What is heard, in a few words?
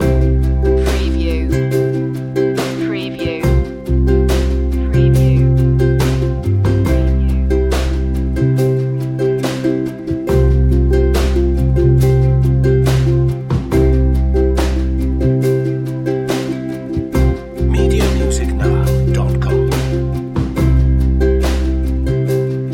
acoustic ballad loop